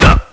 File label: pain shot; firearms